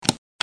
switch it on; Sound FX Real Life

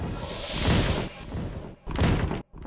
industrial anti lubricant, 090 bpm, drum loop

